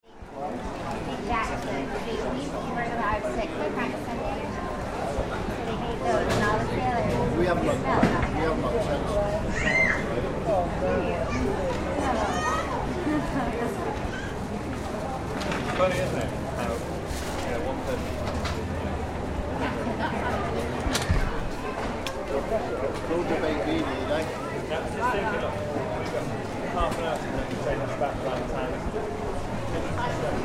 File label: Market Town Crowd Atmos, Old village market - no traffic; Crowds